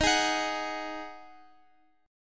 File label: D sharp Minor Double Flat 5th, Guitar, Open F; strummed